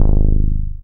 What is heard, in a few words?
BAS MKS-50, Roland